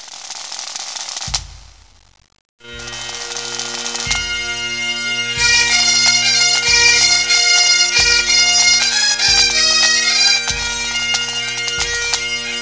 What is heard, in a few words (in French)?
bagad; nantes